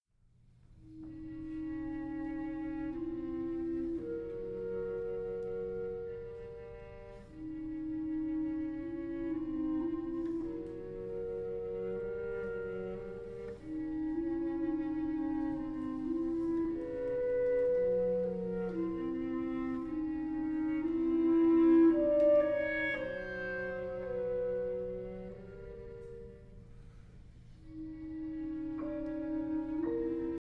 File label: Trio; Halcyon Trio; Gordon Jacob; Trio iii